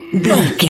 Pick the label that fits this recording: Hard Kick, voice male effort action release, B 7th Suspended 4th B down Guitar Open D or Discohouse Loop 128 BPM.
voice male effort action release